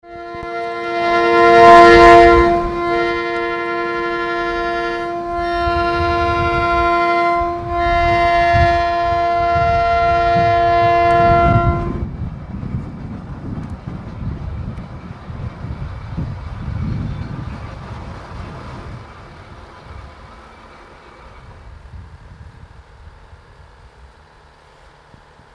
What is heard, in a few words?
Train in Arriaga horn